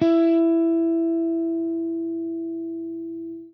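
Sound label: jazz line, guitar; Quantum Leap - Guitar & Bass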